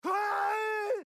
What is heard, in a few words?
Uaaaeyyy